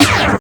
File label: PHASE STOP, DJ NOISES; Spectrasonic Distorted Reality 1